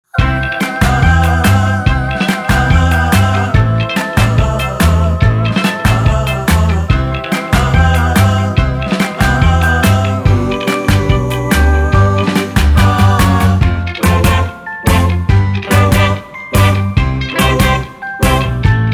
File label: bonus game tune; Archie